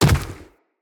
Inf Medium Land Dirt, Footsteps, Enemies, Infested